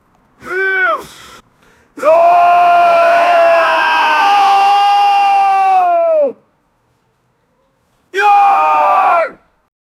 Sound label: a furious man yelling and